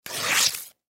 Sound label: Corn Shuck Peel Rip Tear Paper; Food; Vegetable; Foley; Sound Effects:Household; SoundEffects-Blastwave